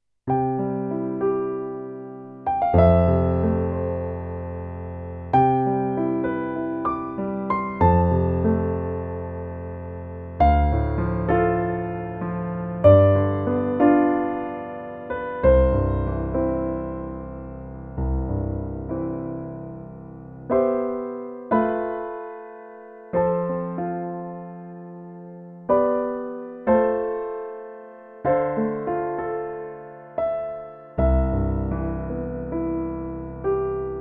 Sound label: Roland FP 60 Concert Piano